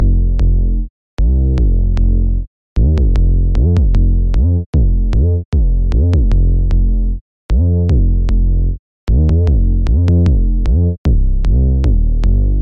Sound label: 808 bass line, 76 bpm